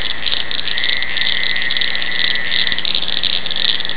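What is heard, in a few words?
northern cricket frog; frogs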